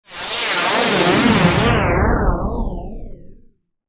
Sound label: Alien Woosh, Sci Fi